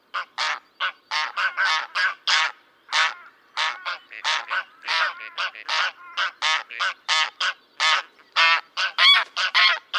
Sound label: Oca selvatica alarm call